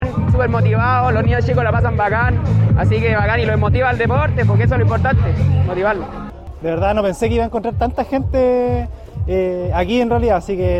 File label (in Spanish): Publico